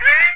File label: BIRD DIE; mordor